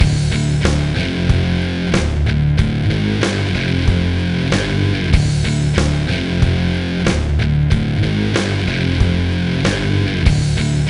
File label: Ditty; NBA ShootOut 2002; PS1